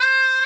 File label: shenai; TamTamMicro